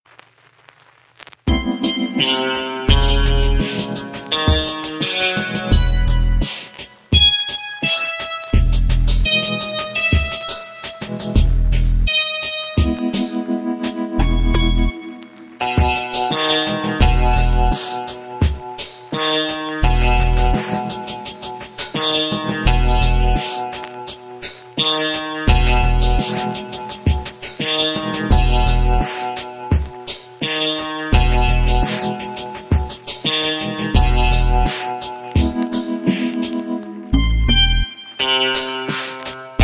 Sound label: Indian Recorder Rap